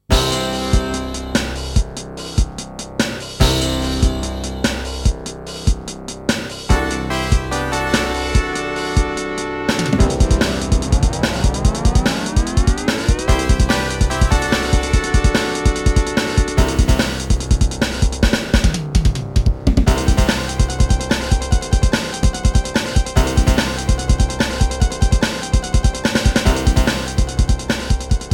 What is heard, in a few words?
Extra BGM Take Off; galaxy force ii thunder blade original sound track